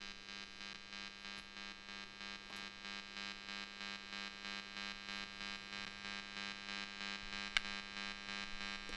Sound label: simple audio buffer noise; positive feedback in amp